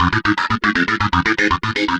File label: synth arp; Electrocution